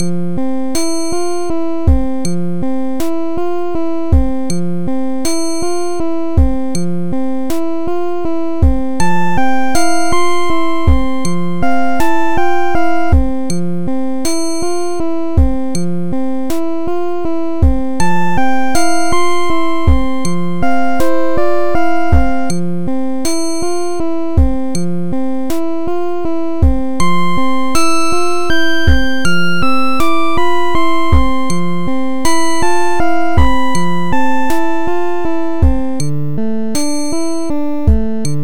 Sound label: meep mop